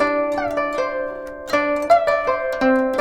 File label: GUCHIN 90 C; INIS - Opium